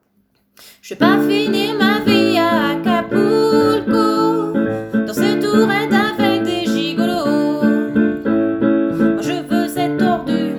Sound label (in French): Enchaînement voix; Belleville